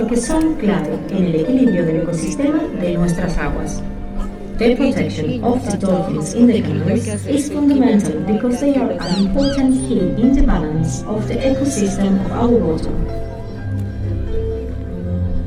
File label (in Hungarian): palackorrú delfin Lanzarote; allathangok